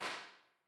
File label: U47, Impulse responses